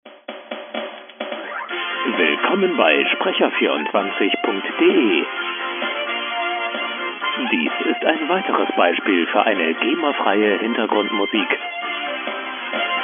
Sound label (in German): musik